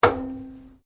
Switch Washing Machine Turn; Various switches; Appliance; Household

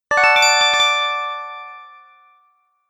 Positive Notification, beep sound effects

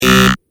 Electronic Buzzer Deep Medium; Electronic Buzzer 5; Miscellaneous Electronic Sound Effects

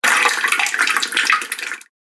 Tap Dripping Water Sound; Dripping Sound Effects; Foley Sound Effect

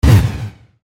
Laser Gun Fire, Shot; Cannon, Science, Fiction

